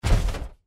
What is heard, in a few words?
run, power armor; clothing system